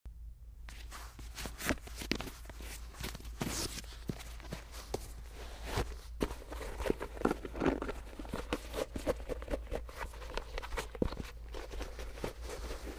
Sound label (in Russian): shnurovka krossovok